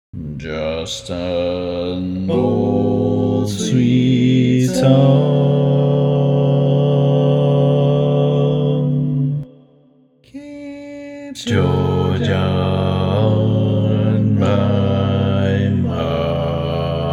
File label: Bass, barbershop tag